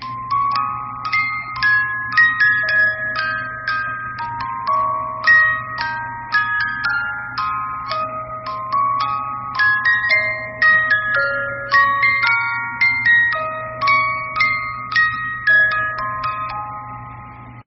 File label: Music Box; جعبه موزیکال باز شونده هندلی با طرح کاش